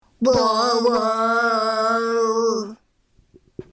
ほら貝

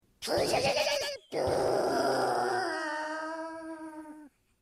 クルマのエンジン; 汽車的引擎; 声マネキング; 声マネキング対決; フブみこさん; ホロライブ; さくらみこ

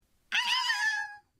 犬の鳴き声

